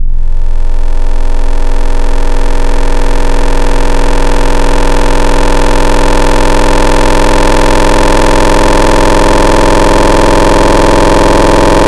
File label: Triangle Morpher, Virus Wavetables FM Wavetables; Cymatics; Serum